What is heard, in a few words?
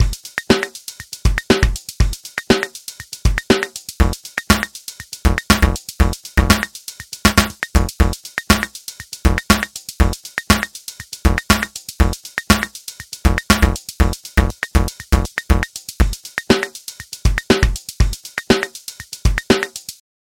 Sampler FM Mod